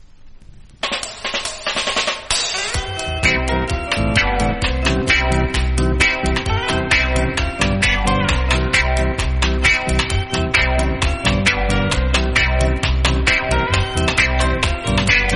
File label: SAX